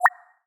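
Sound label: Clicking Sound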